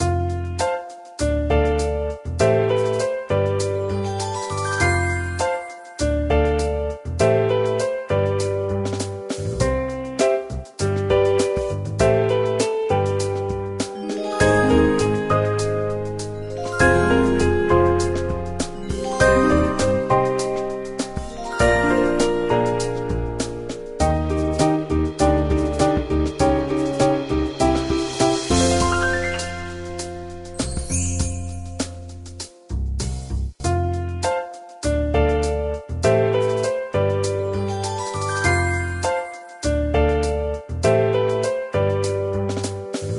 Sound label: Music Menu; hotel mogul; psp